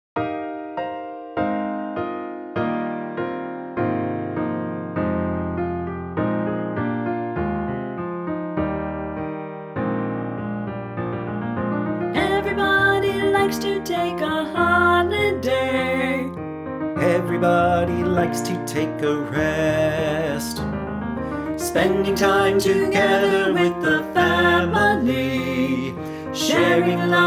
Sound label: vocals accomp, choir; ring bells; christmas 2021